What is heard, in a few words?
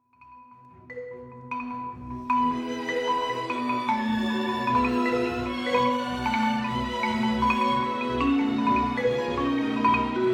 Concertino Marimba